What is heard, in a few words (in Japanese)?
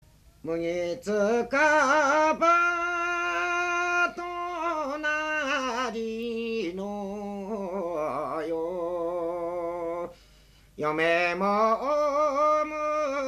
mugitukiuta, minyou